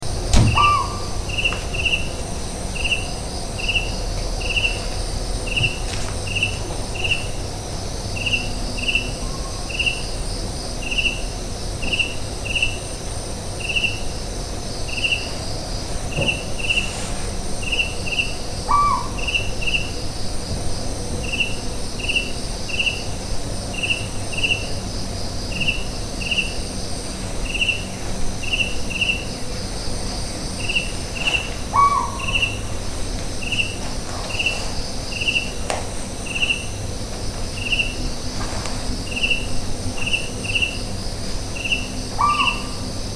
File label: Asian barred owlet Glaucidium cuculoides, Kaziranga